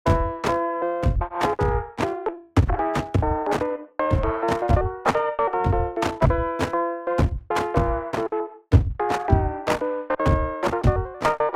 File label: 78bpm car cassette night river parking sunset; weeklybeats 2026